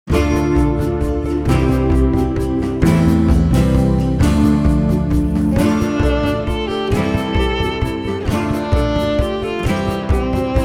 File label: violin melody